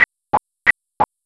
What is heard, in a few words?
tick tack